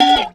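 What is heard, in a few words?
WASHEE BEL, percussion metallic; Rarefaction; Poke in The Ear With A Sharp Stick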